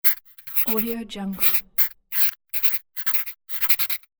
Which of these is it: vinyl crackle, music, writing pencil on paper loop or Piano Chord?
writing pencil on paper loop